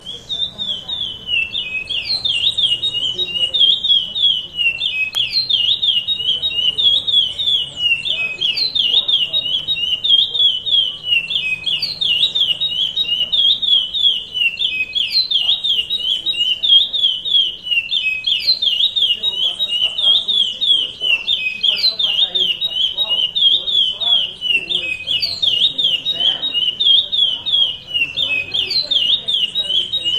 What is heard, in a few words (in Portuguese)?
Canto Curio Paracambi Rompe Nuvem